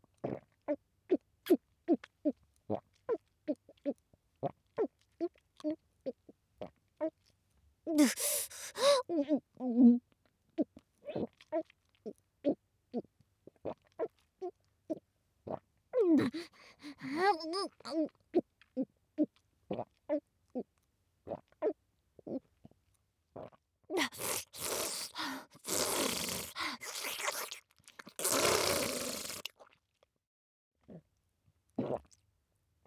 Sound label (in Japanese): ザーメンゲップ